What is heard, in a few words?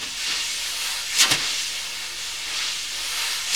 SNR BRSH, Snares; Roland - Rhythm Section; Snares 4; Sn Modules 4